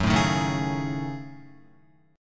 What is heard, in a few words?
Gb Minor 6th Add 9th Gb down Guitar